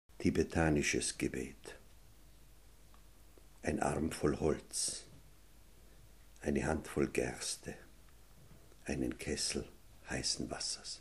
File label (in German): tibetanisches Gebet